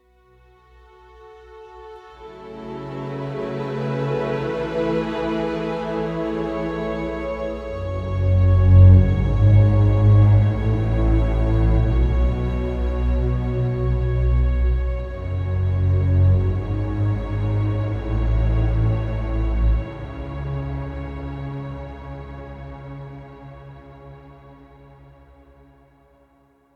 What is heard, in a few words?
Dales Forest Explore